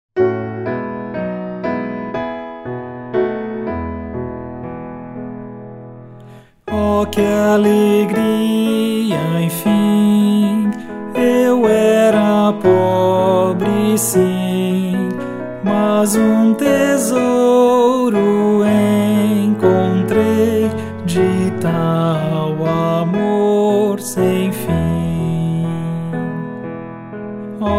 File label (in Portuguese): voz piano